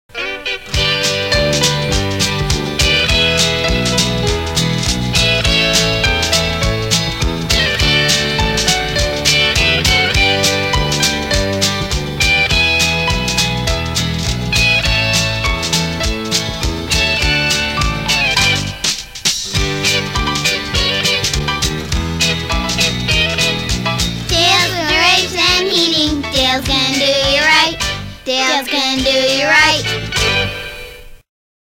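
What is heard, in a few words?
Music with very young girls singing end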